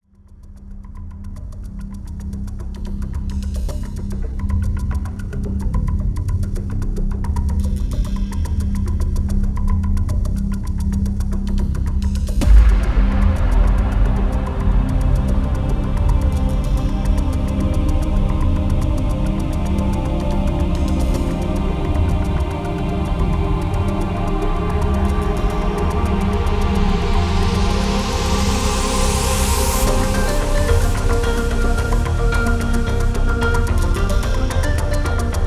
Caves Ambient; Klang; Rock Bottom

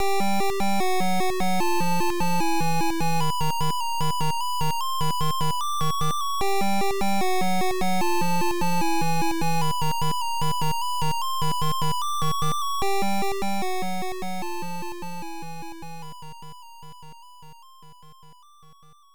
Combat BGM; Dragon Buster; MSX2

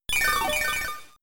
Mega Drain; Pokemon; Gen 3; attack moves; RSE

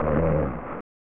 Snores; The Simpsons Bart's Nightmare; SNES

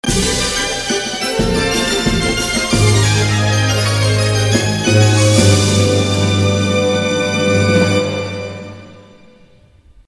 Duel Victory!; Yu-Gi-Oh! Duelist of the Roses

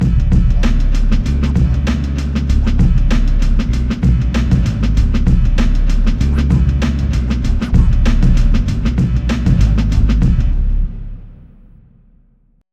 Ditty

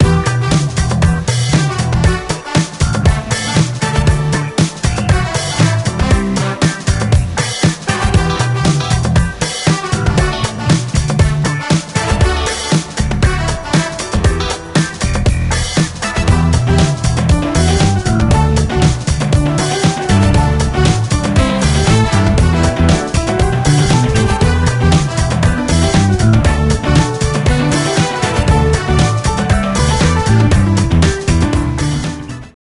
Latin Dance Music

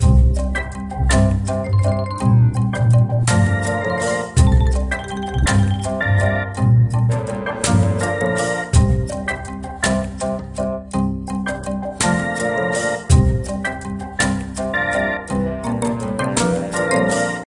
Jungle Level

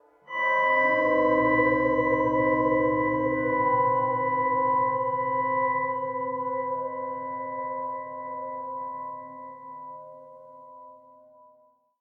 sting; minecraft story mode gamerip 2016; Access Denied; DaytimeZombies

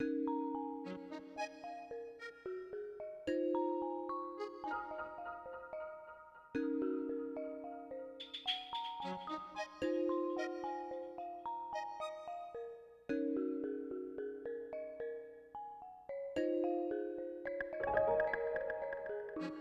Rainfall (Night); Pikmin 3

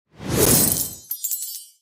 Coins

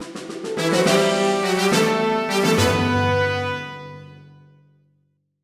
Music Sting Lightning Bolt Collected, pinball; Pinball Pulse: The Ancients Beckon

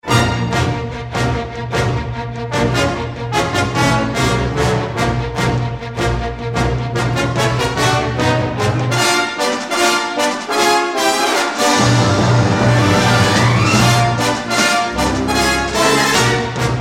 Space - Combat; Tie Fighter Attack

